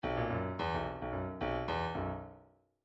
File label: midi grooves rock